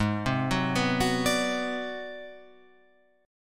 G sharp 7th Sharp 9th Flat 5th G sharp down Guitar Open F, chord; G#7#9b5; arpeggiated; JGuitar